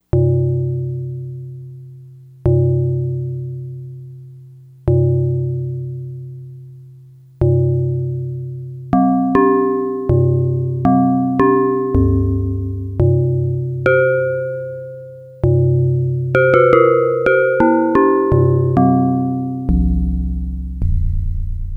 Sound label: Templegong, Yamaha DX100; Factory Patches